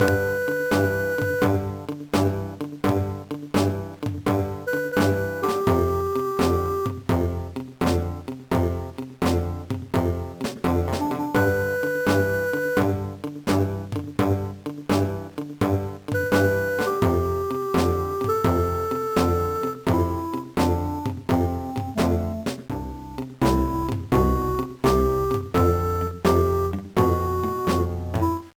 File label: Country Jump Rope; Wario Ware, Inc.: Mega Microgame$; GBA